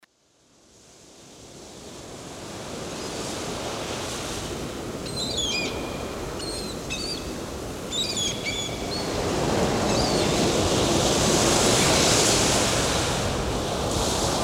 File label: sea birds